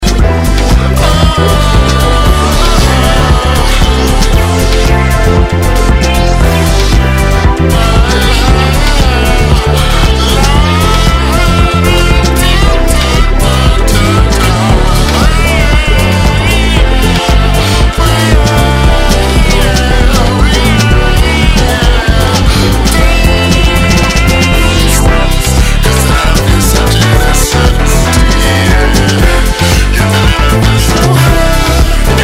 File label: Pure Pop